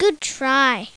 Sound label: bummer